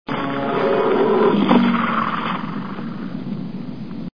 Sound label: growl